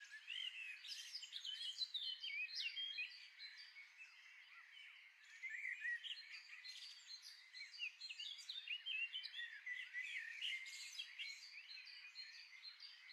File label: ambience General; jack and beanstalk